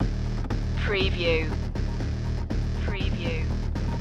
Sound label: hard loop